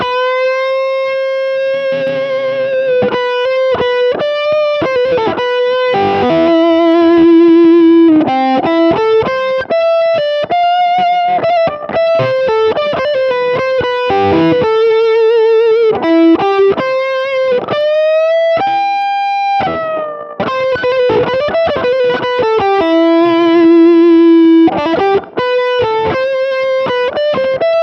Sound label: stems Dry